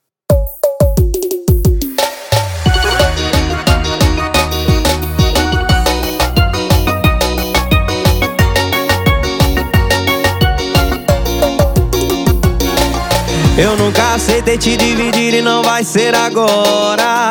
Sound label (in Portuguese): piseiro